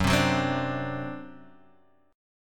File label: D 7th F sharp, guitar